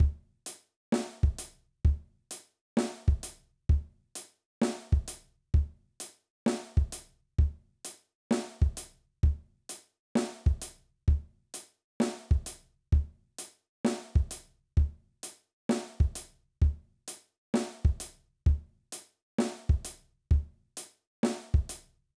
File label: Reggae Beat, drum